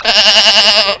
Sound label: bleat